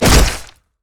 basic attack knee; rengar

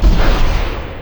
basicattack; LoL; janna forecast

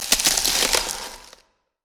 ring of frost; LoL; lissandra

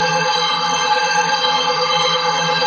stair laser loop; odin

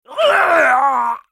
dying; Olaf

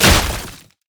basicattack dragon; LoL; shyvana

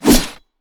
basic attack claw; rengar

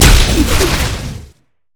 pick a card red card; LoL; twistedfate

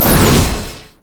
w oh initial; Vel'Koz

